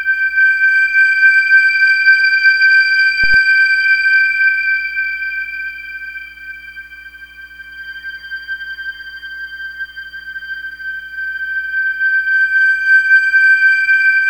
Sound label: ETHEREAL; Spectrasonic Distorted Reality 1; ETHEREALITY